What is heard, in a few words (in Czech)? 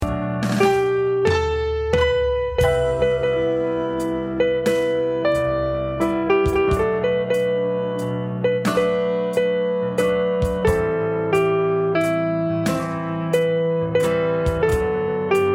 Podklad refren piano spomalene